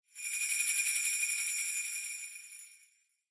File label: Sleigh Bells Dry Long